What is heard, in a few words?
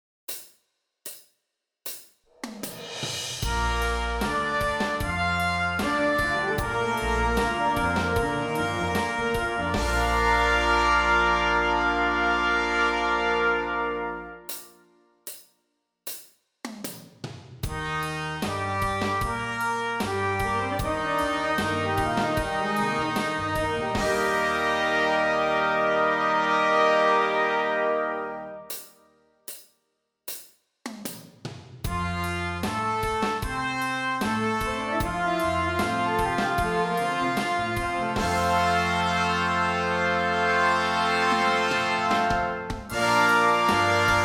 Arpeggios and Chords